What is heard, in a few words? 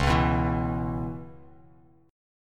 Db Minor 7th Db, Bass Standard; strummed; JGuitar